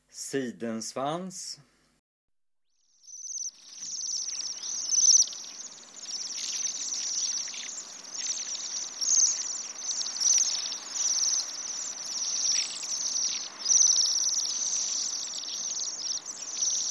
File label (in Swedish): Sidensvans